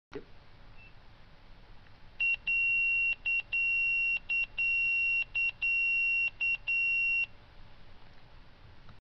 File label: Tone B, Watchdog Alert; OSI